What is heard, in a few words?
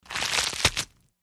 Lettuce, crunchy rip, short and sharp